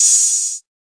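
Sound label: urban trap hi-hat; Urban Trap Kit; Hip Hop; STYLE KITS (Hip Hop, Etc)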